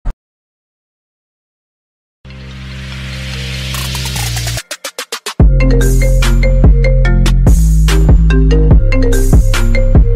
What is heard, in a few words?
IPhone 13 pro